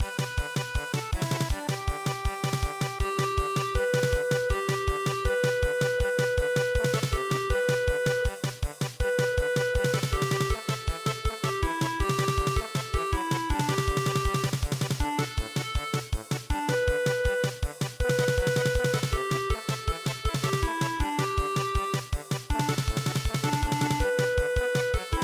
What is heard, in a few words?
German Polka, midi